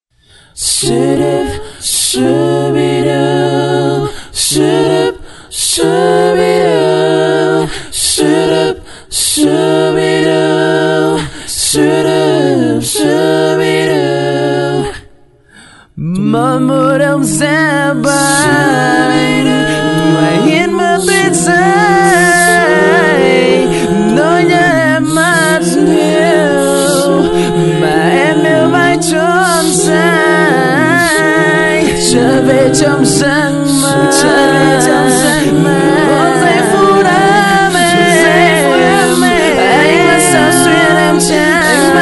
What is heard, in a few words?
rap; viet rap